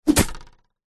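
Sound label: spear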